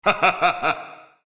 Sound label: voice human; maoudamashii